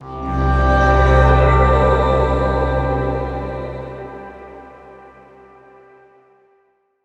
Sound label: freespins enter; DragonsLucky8WD; wazdan